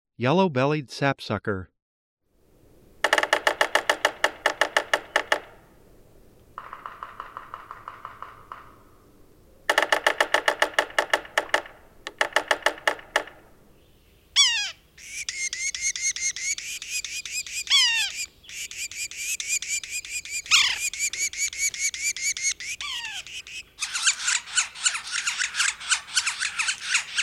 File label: yellow bellied sapsucker